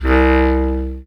Bass Clarinet C1; VarazdinOrchestral

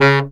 B SAX STC C#2, Baritone Sax Stacc; Orchestral; VarazdinOrchestral

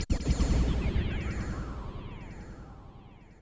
FX Industrial 140

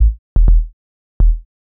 HOUSE BASS 125 A

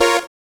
dance chord

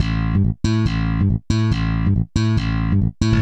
TECHNO BASS 140 A

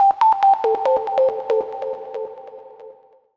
TECHNO SYNTH 140; Keys

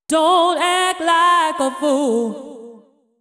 deep house female, 125, A, vocals

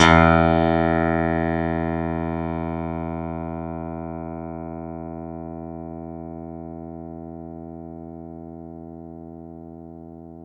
Clavi, Digital Keys

